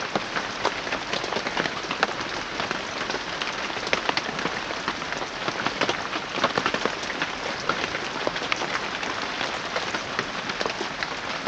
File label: Chillout Lounge, Rain in the City